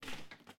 Scarf Open; MiSide

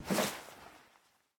Gamepade Take Player; MiSide

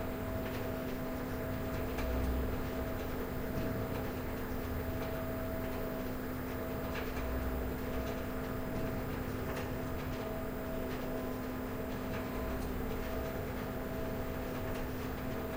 Door Move; MiSide

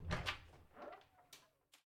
Open Door Break; Game rip; MiSide

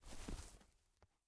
Ladder Up; MiSide

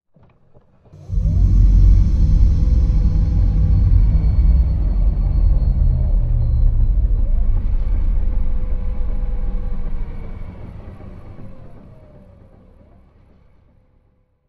Restart World; MiSide

